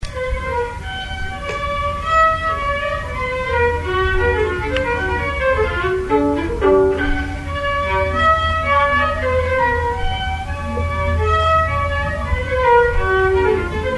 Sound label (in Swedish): Polska; knpp Polska; Allan o Anselm Hellstrom